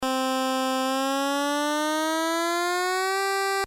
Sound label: portament